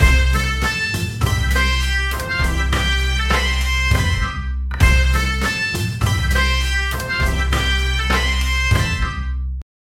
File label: upbeat energetic bollywood; sound effect